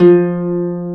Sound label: Celtic Harp F2; Planet Earth; Digital Sound Factory Planet Earth